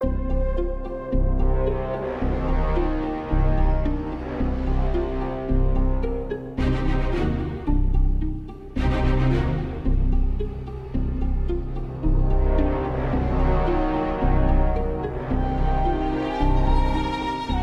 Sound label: Undead Elemental Gate (Conversation)